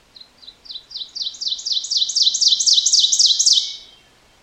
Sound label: ovenbird